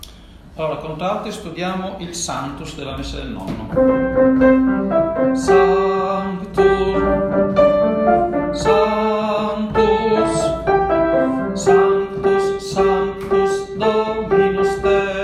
Sanctus Contralti; Santucci; Messa del Nonno

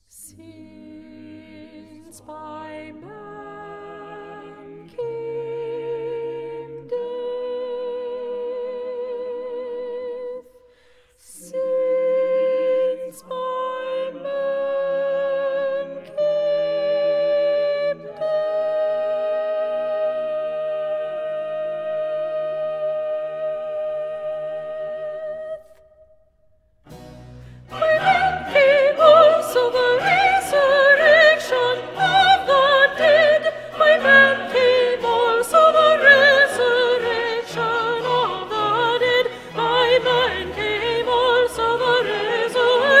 Since by a man came death soprani; Messiah; handel messiah

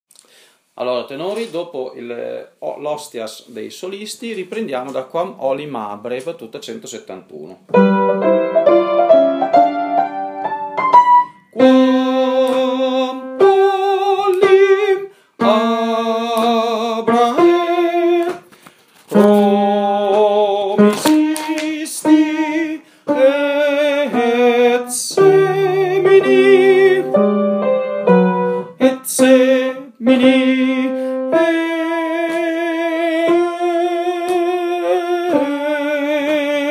Offertorio Tenore; requiem rossini

